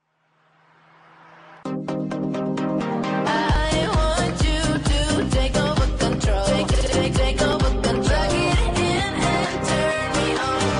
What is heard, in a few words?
hiphop